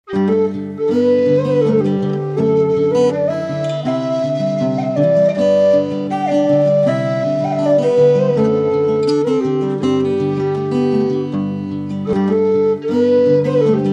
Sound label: whistle; sally garden